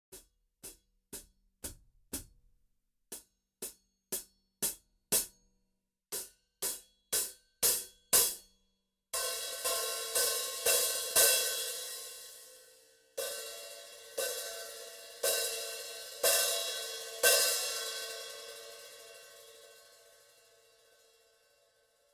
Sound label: High Hat 13 - Hickory Sticks